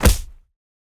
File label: Dash Slam Impact; boomerang fu